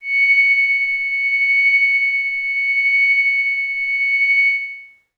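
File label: Glass Howl Kawaii F sharp 6; pads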